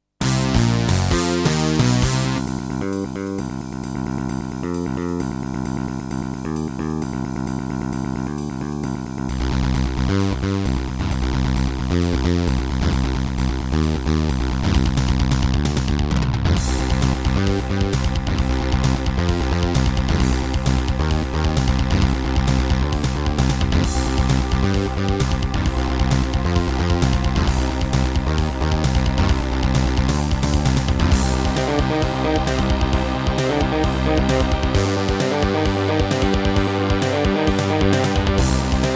Hizon DB333; sigil doom episode 5

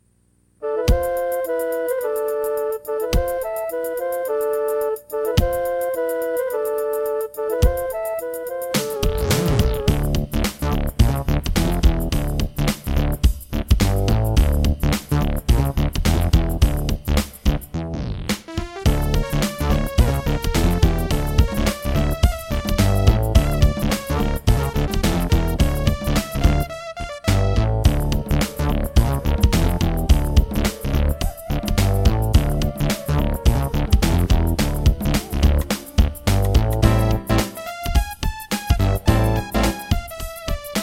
Egypt, Serdaco E-Wave; Lost Vikings

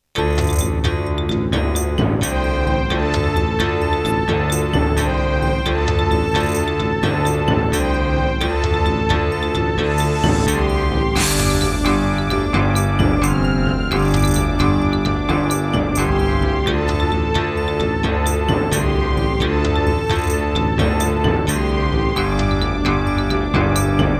Roland SC-33; Heretic

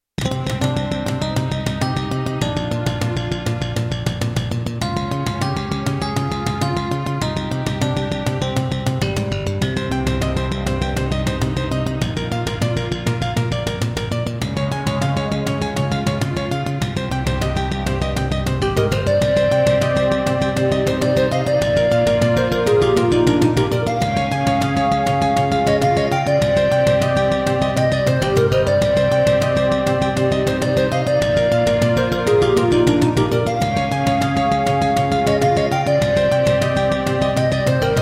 Roland JV-1010; Hocus Pocus

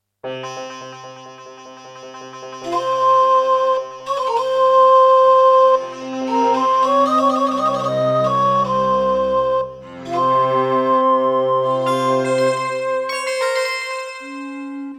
Roland SC-88 55MAP; Prince of Persia 2

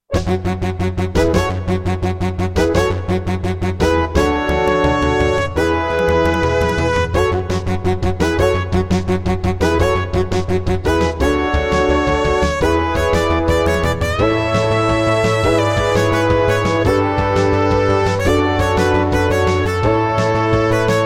Intro, Roland CM-32L; 4D Sports Boxing

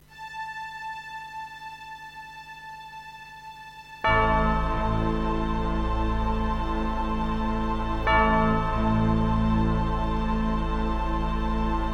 EMU SoundEngine; Gabriel Knight 1

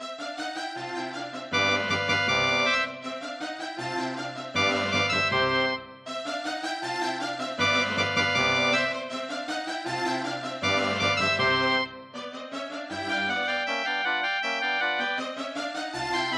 Casio LD-80; classical; Mozart; Concerto K467 partial

